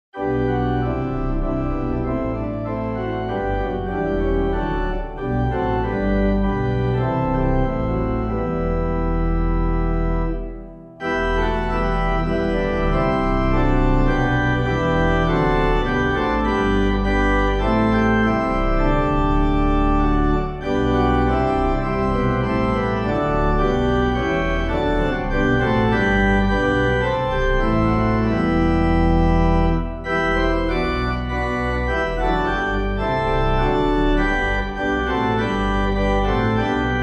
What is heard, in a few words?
organ